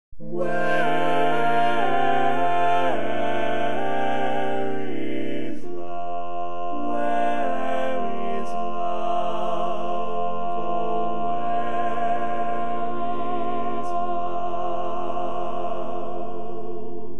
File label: Lead